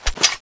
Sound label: scar clip out; cso; scar oz